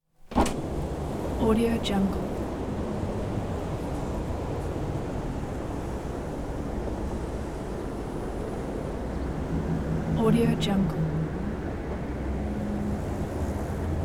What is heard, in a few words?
apartment with open windows